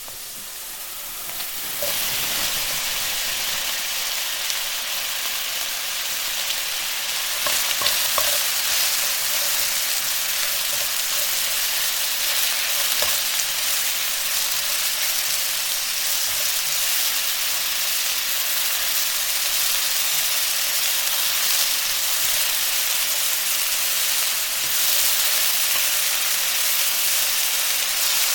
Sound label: stir fry vegetables, 野菜炒め